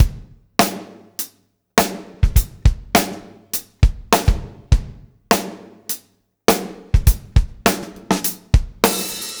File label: BACKBONE, Backbeat